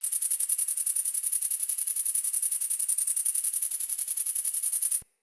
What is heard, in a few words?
Meadow Close Grasshopper; Day Animals